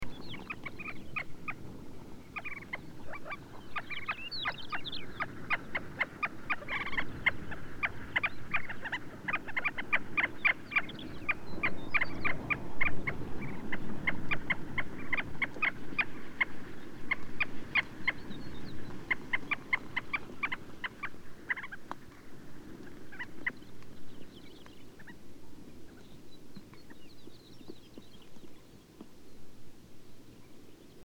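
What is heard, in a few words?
Pallas's sandgrouse voice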